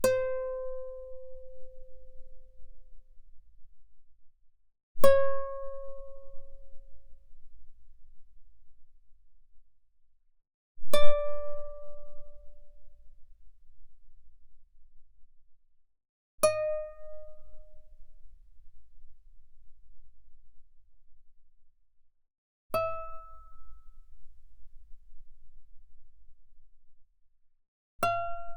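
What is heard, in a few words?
Guitar fortissimo sul B C5 Gb5; mono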